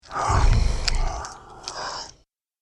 Starving Beast Eats, monster sound effect; Andrew Fleming

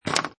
Pinball Sound Rattle And Clank; Video Games

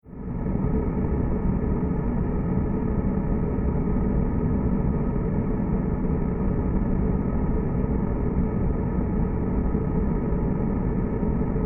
Drone Scifi Tonal Spacecraft Engine Room Ambience; Airy Hollow

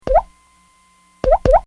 Comedy Effect Drip; Funny Sounds (Sound Effects:Comedy)

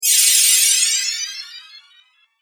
Magic Shimmer Spell; Magic Sound Effects

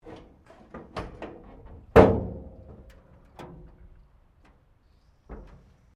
Car Holden Hd Premier 1965 Lift Bonnet; Classic Australian cars; Vehicles

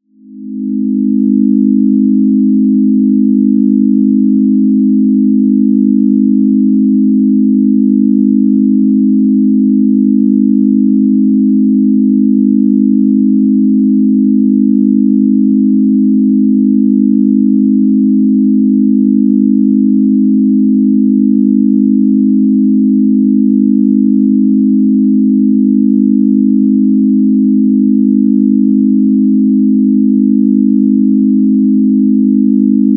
Drone Musical Tonal Major Chord; Musical Sound Design; Blastwave

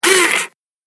Digital Camera Closing Lens; Foley Sound Effect

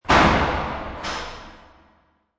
Dungeon Door; Distorted reverse whoosh; Whooshes; Sound Design

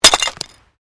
GLASS SHARD SMASH

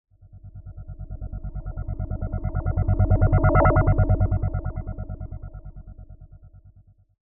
Hover Robot Pass By, Scifi; Robots; BlastWave

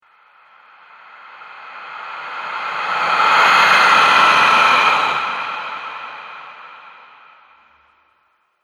Shadow Spirit, ghost; horror

